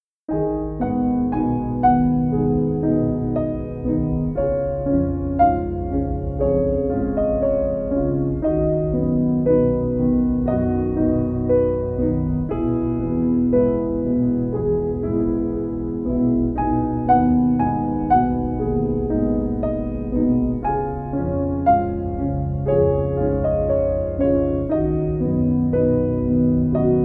Chillout Jazz

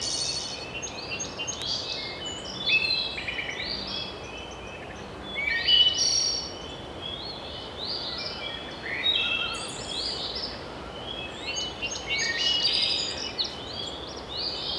Soothing Ocean Sounds

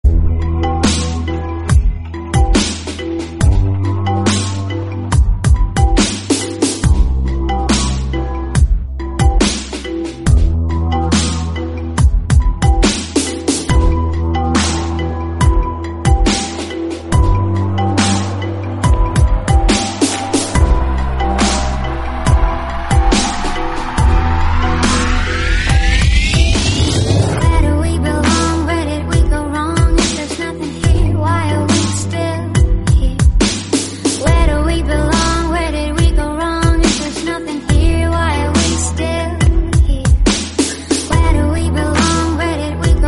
Dubstep Remix

